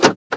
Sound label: vehicle lock